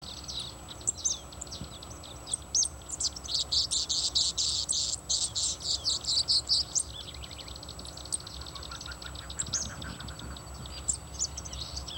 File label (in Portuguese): Canto Tipio